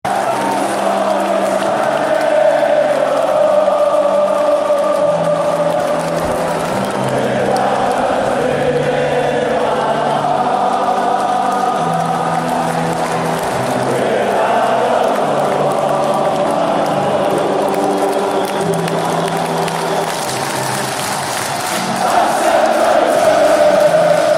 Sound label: music version